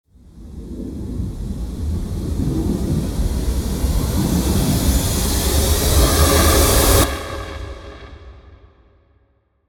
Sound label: cinematic riser; wildfire